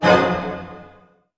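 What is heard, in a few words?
C diminished pianissimo, orchestra hits; Sound On Sound - Peter Siedleczek's Orchestra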